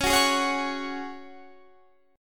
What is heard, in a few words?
C sharp Suspended 4th C sharp down Guitar Standard; strummed; JGuitar